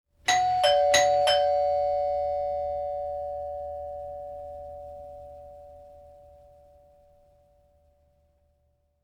Doorbell

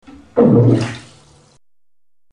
iguana farts bathtub

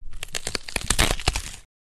rip arm off

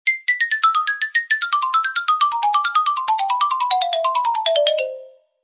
XYLOPHONE

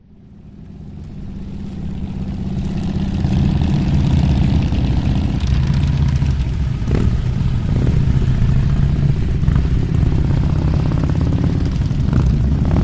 motorcycle gang

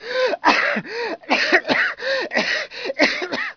people

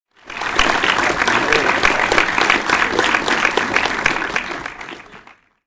Applause

